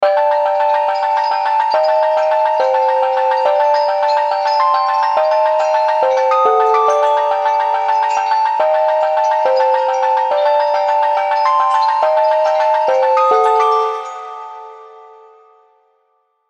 Lead; Frame; Unison Ice'd Out One-Shot Collection (Omnisphere)

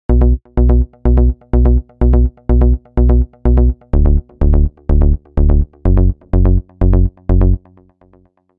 Bass, Techno; Guardian; Unison Techno Collection for Serum

